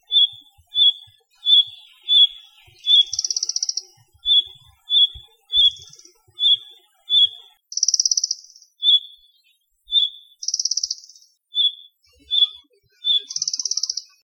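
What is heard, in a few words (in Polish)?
muchołówka mała głos zaniepokojenia; ptaki glosy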